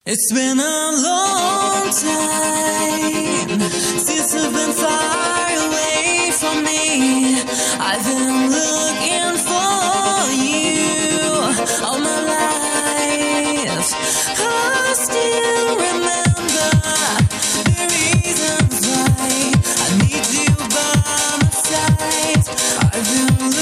Disco Chill Vibes

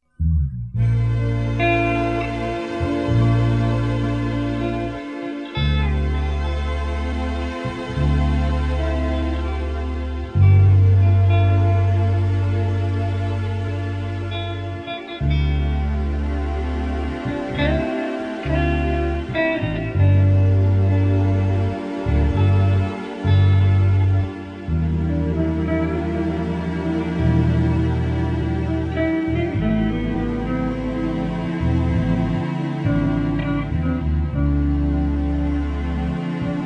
432 hz guitar chill; falling thoughts

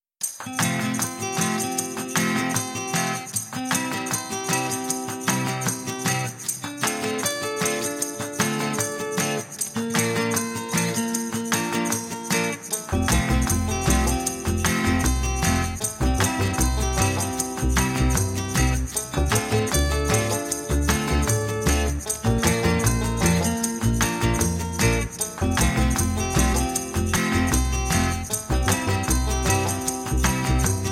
acoustic vibes; 432 hz; good times

